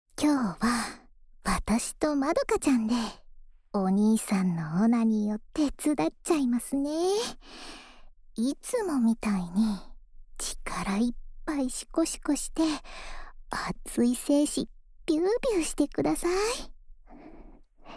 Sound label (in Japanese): オナサポ用深い耳舐め 両耳, ASMR